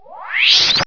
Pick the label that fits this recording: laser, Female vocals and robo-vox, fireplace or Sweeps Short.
laser